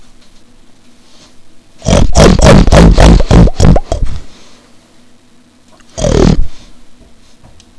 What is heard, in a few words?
pig